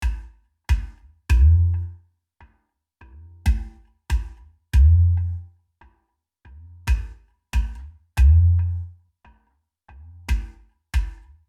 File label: hang bass